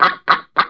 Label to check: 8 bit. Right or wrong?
Wrong